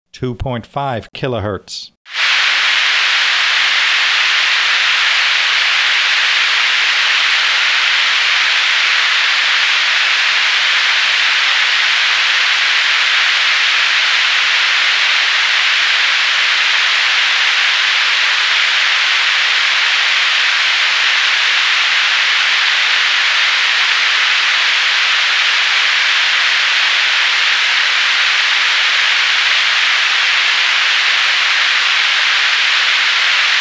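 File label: pink noise 2.5khz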